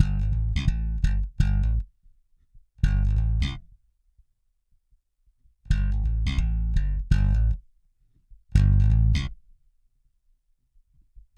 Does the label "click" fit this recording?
No